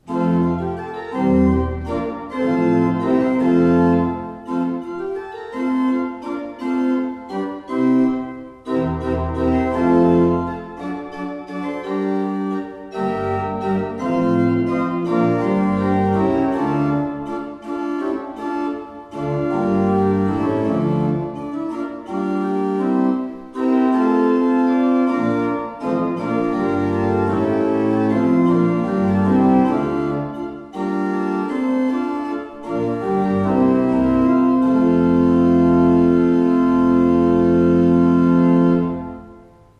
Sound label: Ott, pipeorgans